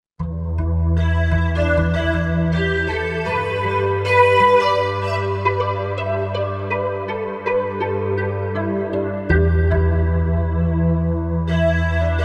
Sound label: Velo Swich, Korg MSC-1S; Korg MSC-1S Variety